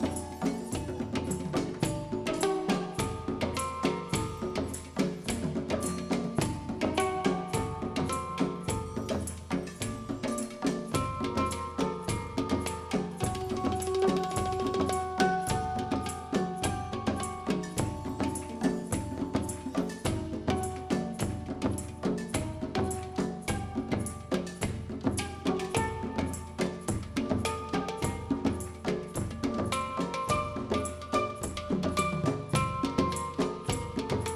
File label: drum Song; Arabic; World Music